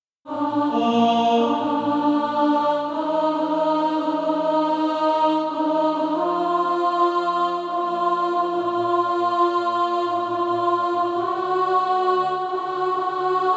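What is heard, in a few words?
alto, Sheet music (SATB) including Practise Music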